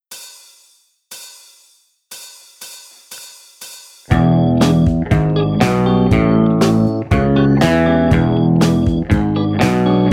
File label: Easy Guitar Riffs Fast